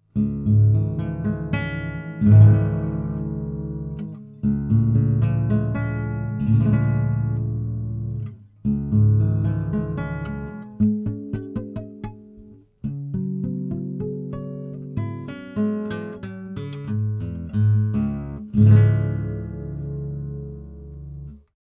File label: goldmike mk2 RB 100 Flair High Hi Pass